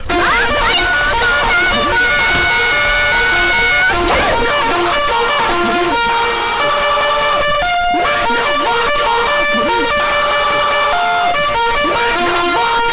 alarm

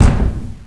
door bang; caged

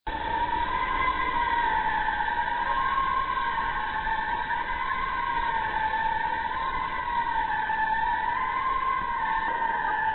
ambience night

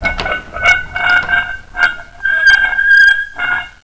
lever

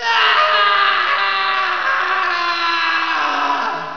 scream

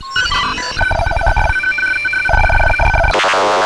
tripmine move

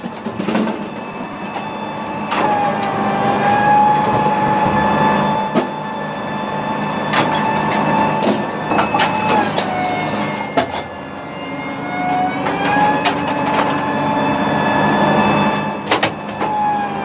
tank reload; barbarossa

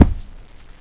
player step

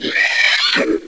attack

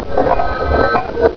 lever

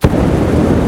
flame on

